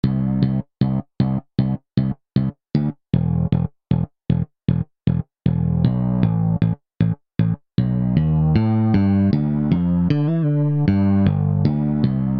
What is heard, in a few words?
bass synth